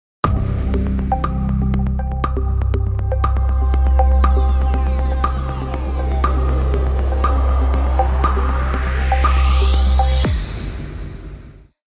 lockdown door countdown; flcs basics

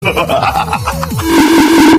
bonking spam

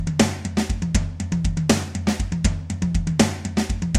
drum tambores

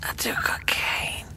whisper; metalocalypse; i do cocaine

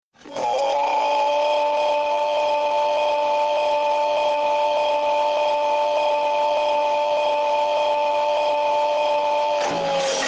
ooooooohhhhhh; big smoke

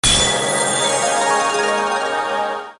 healing sound

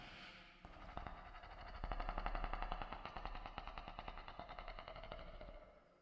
clicking

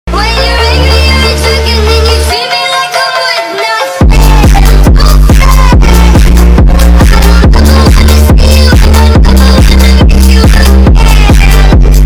sigma bass boost

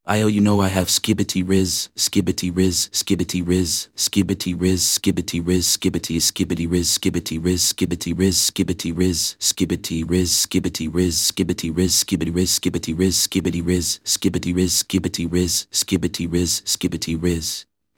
rap

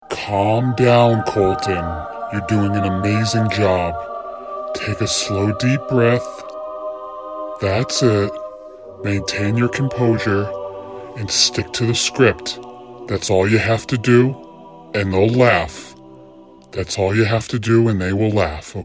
holy voice

